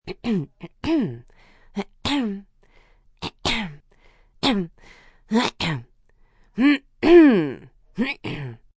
Clearing throat, female, 'ahem', long (human voice); Cough MultiAhem; VoiceBox